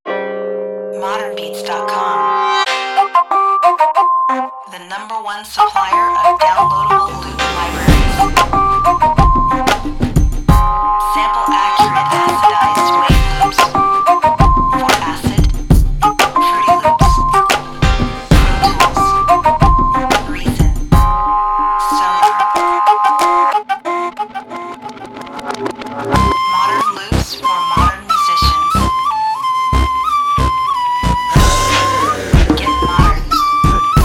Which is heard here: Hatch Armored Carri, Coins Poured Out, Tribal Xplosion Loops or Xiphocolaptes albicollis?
Tribal Xplosion Loops